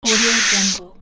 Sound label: foam or graffiti spray